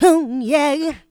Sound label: Vocals, Adlibs; Houseworx